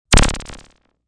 Squiggle; Squiggles

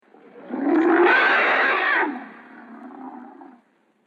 Dinosaur Screech; Dinosaur Screech 3; Animals

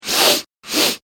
human sniffing; Person Sniffing; Sick; McKinney Sound

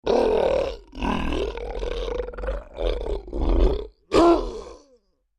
Creature Large Grunts Pain; Monsters; Sound Effects:Horror; Big Room Sound

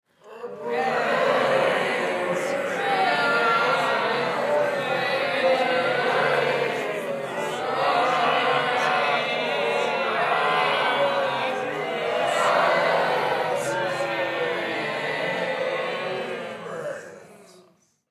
Human Crowd Studio; Zombies Brains Moans; Human Crowd Studio Reactions Zombie Brains Moans; Sound Effects:Horror

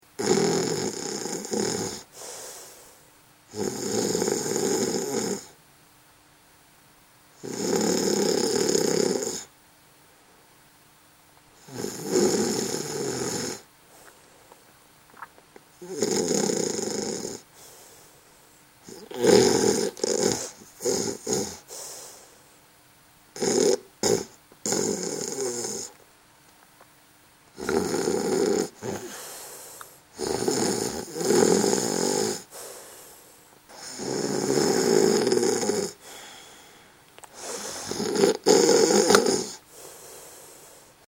Snoring, Female Snore; human sound effects

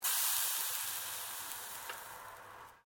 Water Sizzling Hot Pan; Heated water sounds; Kitchen; Household

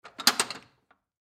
Ratchet Pick Up; Power Tools; INDUSTRY; Blastwave

